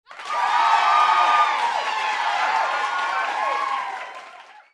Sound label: CHEER; Mall Tycoon 2